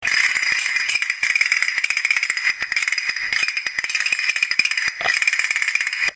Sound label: castonettes